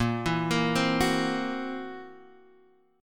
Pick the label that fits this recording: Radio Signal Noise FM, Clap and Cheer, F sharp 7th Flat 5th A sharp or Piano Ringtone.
F sharp 7th Flat 5th A sharp